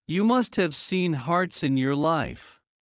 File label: Heart low; Classroom humanbody